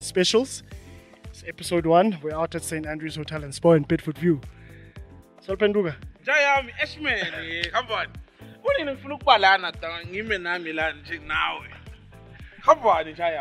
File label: Amapiano Mix